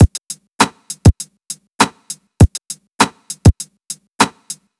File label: BEAT, funk; USB Soundscan vol.47; AKAI; Hip-Hop & R&B